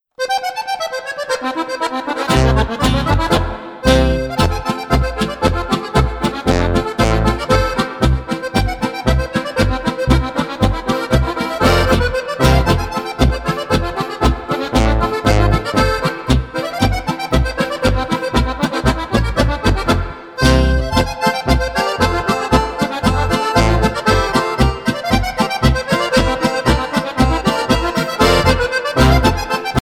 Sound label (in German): Akkordeon